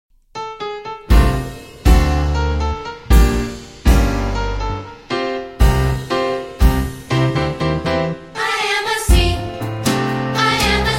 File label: Vocal